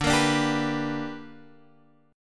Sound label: B Minor Major 7th Sharp 5th